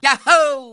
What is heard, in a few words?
yahoo aaa